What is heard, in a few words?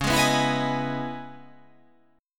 C sharp Minor 9th C sharp, chord